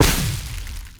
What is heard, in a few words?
Updated Shot, weapons; Warframe; Moa Pet; Cryotra